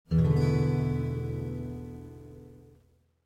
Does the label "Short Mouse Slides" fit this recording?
No